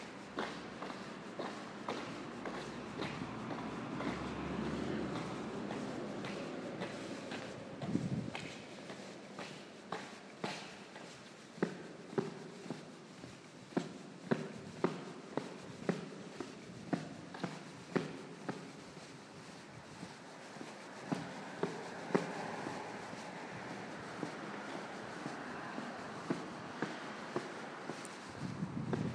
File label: long echoing tunnel; below the philharmonie luxembourg